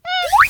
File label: Caramel Out Sound; Jolly Battle